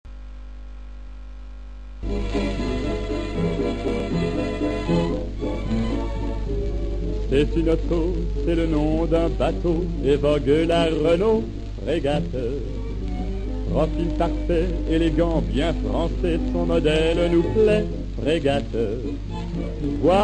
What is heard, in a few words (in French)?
Pub Fregate chantee